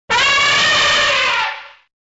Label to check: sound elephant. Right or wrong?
Right